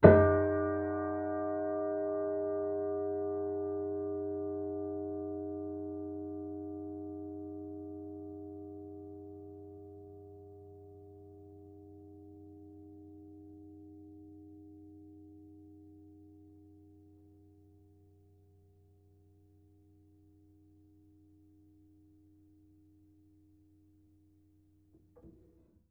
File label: Piano Harmonics, Harm Low Mid